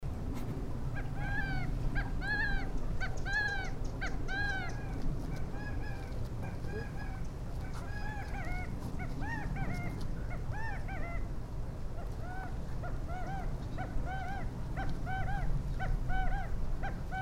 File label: Gambels Quail; Salton Sea